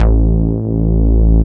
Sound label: bass